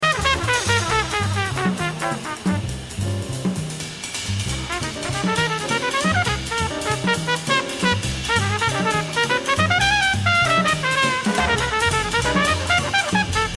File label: rhythm